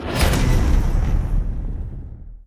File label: wild On Wild Landing; mercy of the gods